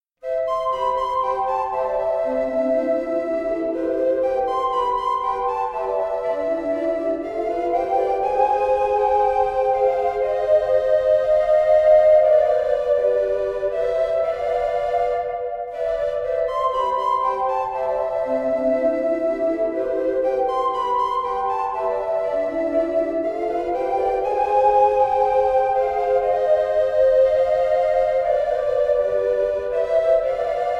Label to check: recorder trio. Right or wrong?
Right